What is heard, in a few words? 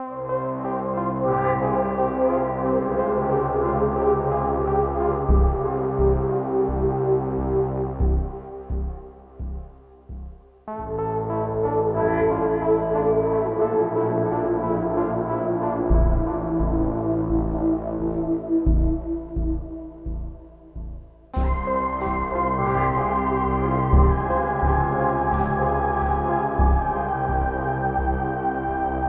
Day Stinger